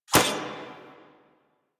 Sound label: Rising Blades Sword Impact; Warframe; Warframes; Pax Duviricus